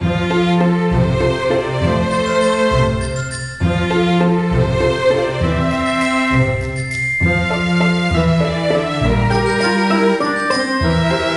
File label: Mus Classic Loop; Home Designer Perfekt Gestylte Zimmer; NDS